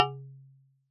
synth

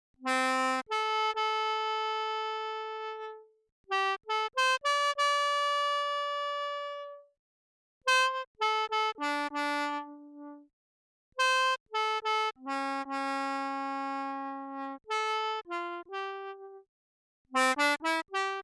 harmonica

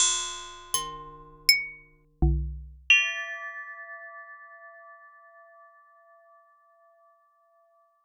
impacts, solids

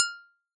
synth

